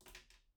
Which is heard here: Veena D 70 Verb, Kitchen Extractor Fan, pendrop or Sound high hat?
pendrop